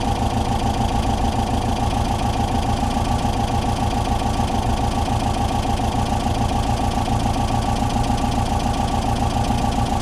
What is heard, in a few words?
Solid Lifters Cold, Engine Sounds